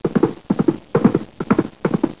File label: hooves